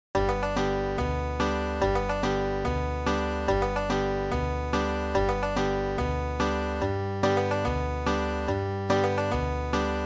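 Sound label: triplet exercise, banjo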